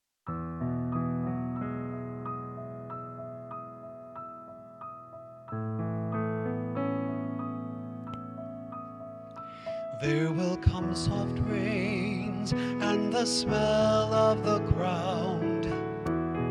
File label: There Will Come Soft Rains Baritone